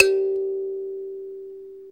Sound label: KALIMBA A2; ETHNICSTUFF